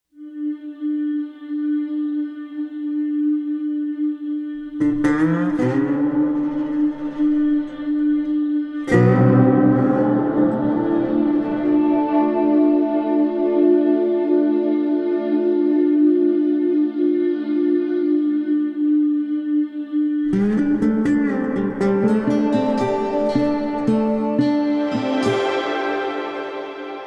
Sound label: End Music; Bahro